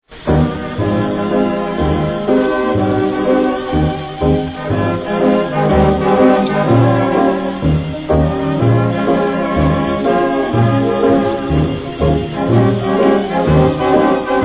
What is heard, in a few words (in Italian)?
Orchestra Jazz Sinfonico